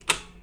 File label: electric switch